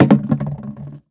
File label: Crash Objects Plastic; gamerip; Car Simulator 2; android